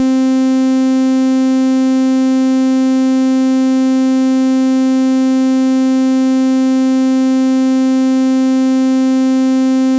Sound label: Bass SAW, Yamaha DTXpress; drum machine